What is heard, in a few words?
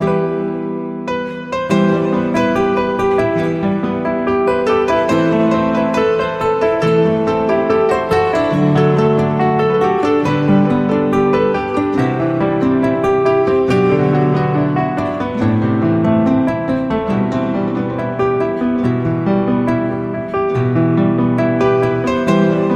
Piano Guitar